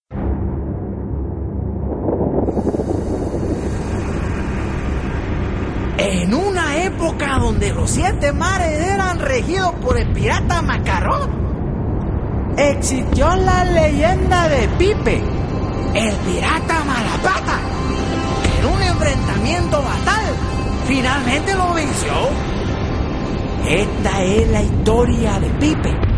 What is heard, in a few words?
pipe